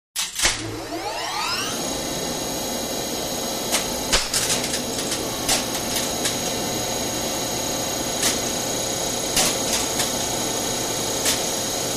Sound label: Elevator Servo Motor